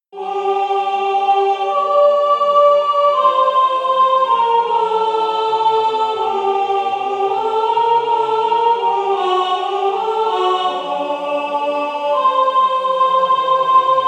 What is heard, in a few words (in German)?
sopran; generationenchor